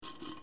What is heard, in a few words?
step; dungeonmaster